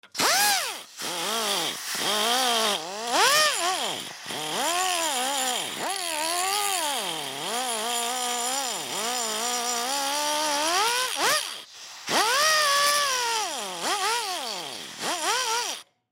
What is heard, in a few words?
Air Grinder Scrape Metal Sparks Pneumatic; Industry; Blastwave